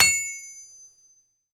anvil hit

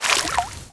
swim little waves

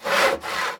SAW

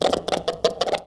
SNIFF

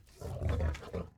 RUN

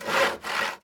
SAW; mahlendur

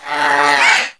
hurt

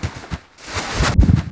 BED USE

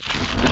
grind

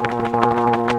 WINGS

